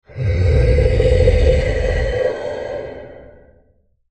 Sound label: Monster Growl Deep Snarl; Beast Creature; Horror; Animal; Monsters; Blastwave